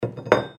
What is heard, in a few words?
metal drops